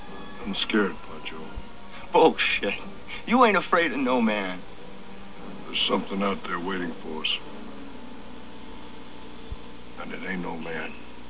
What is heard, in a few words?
scared